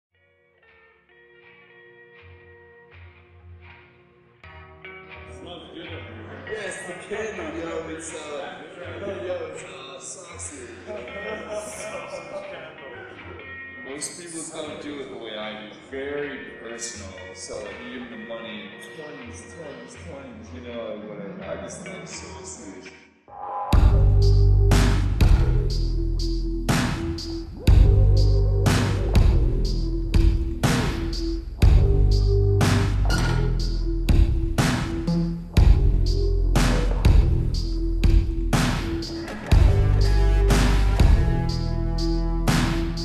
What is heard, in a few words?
slowed